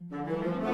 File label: Deception Start 50bpm; Music Dialogue